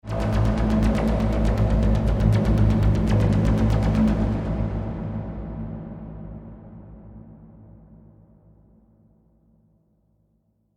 Rhythmic Drone Musical SciFi Trailer Techno Tension; Drones; Sound Design; BBFX